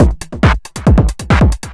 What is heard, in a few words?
percussion loops, Techno; PS-45